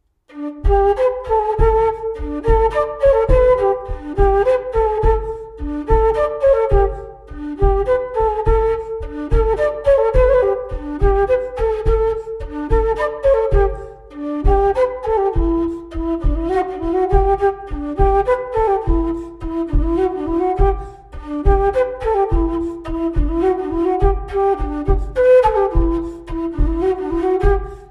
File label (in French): Montagnarde; Recueil De Raoulx